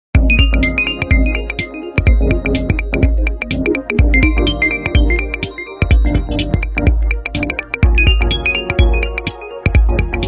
Ringtones, Nokia; 3600 slide

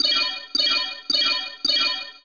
Bell Right; Samsung SGH-i710

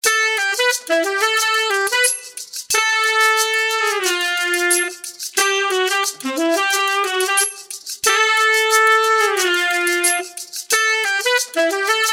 Jazz; Yezz; Max-1

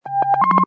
beaming end; Samsung SCH-i539

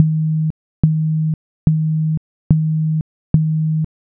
Vib pulse; Motorola E398 Asia

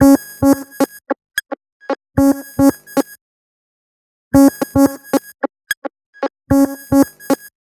saw, alarms; Nothing Phone (2)

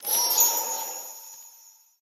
winter wind, Seasonal Celebrations, notifications; Google; Google Sounds

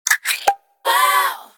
Pop, notifications; LG Revolution VS910

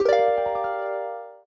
Chat In, notifications; ZTE Z2332CC

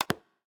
newparticle lock, phonetones; LG V60 ThinQ

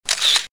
shot sound, camera; Huawei C5100 Esia Hidayah Syiar

